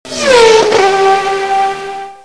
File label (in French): Formule 1